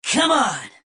negative vocalization; Adachi

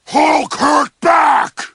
backstab hurt; superheros; the hulk

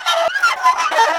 metal scrape; kraven manor; mannequin

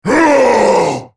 ultimate effort; Volibear

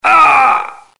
grunt heavy; tf; max payne

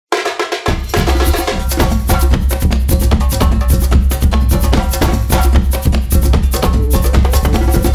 emote capoeira; fortnite emotes

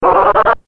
woof intensifies

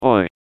pain sharp; John Madden

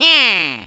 throw; tf; waluigi

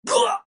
spy pain sharp; Adachi

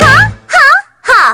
hahaha; mlp

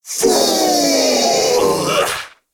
ahahaa fool; slender; snatcher

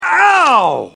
stun; shrek

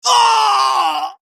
scream

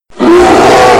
jump

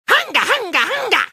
dance; tf; crash

